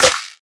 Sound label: original clap sound b key, Royality free hand clap tuned to the B note. Loudest frequency: 3234Hz